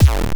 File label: grunge, drum machine kicks; deepsky